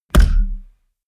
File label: heavy saturated, hits impacts; whooshes rises